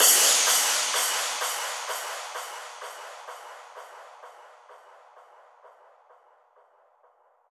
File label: crash, Key D#, Bpm 128, Big Room House; Loop Nerds; Big Room House Allstarz